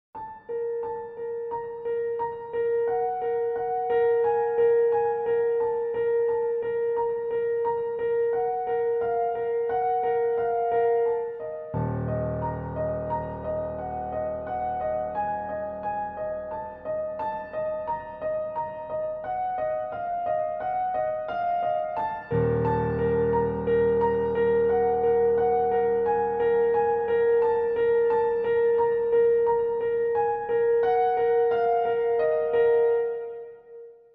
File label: Piano Riff